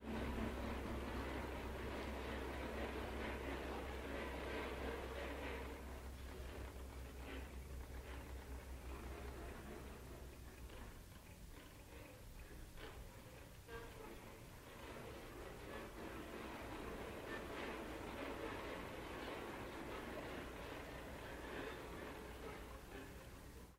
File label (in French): grenouilles